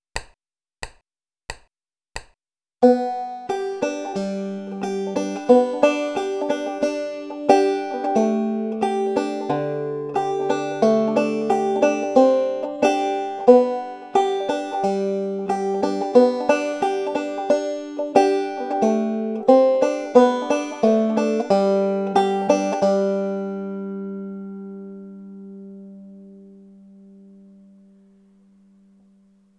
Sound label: thumb lead